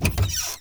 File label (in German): Tuerknarren